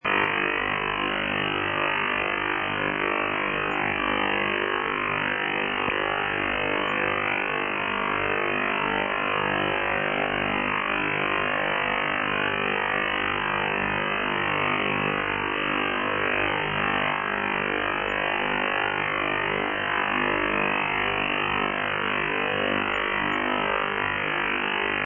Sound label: PLUTO 50Hz